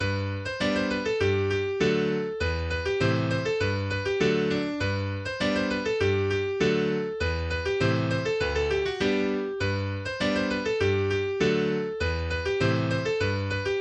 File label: German Spa Dance